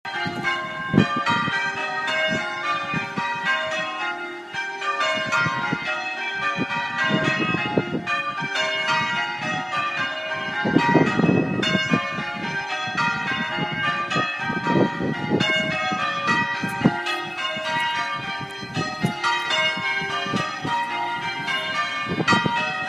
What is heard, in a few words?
bells; scraptoft